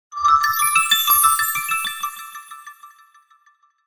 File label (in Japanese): kirakira